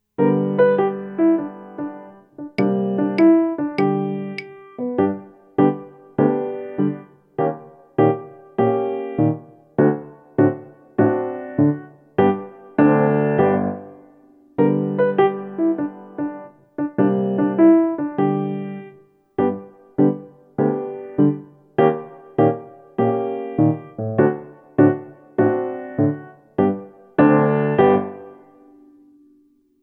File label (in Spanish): El pizzicato del pingüino